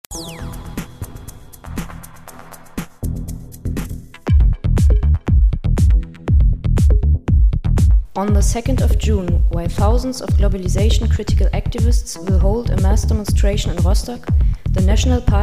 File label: jingle; schwerin